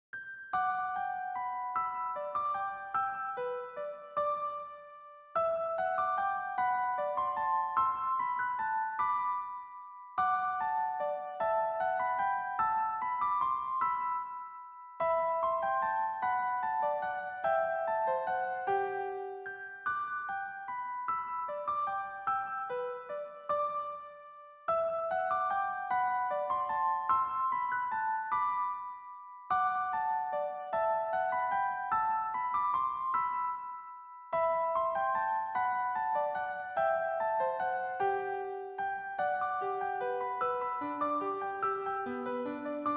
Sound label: musicbox; The music box